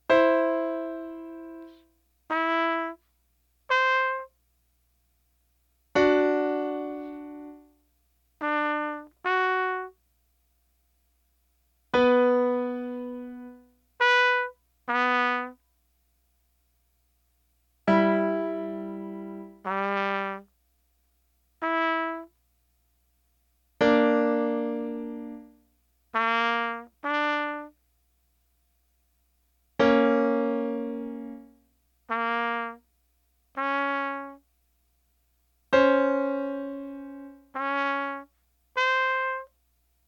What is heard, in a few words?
ear training intervals harmonic